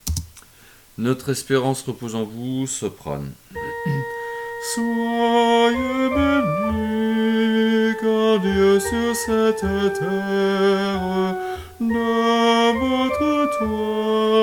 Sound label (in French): Notre esperance soprano